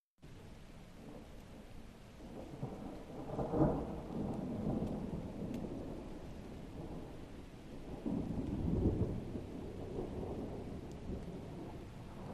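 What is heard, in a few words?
Steady Thunder Very Light, distant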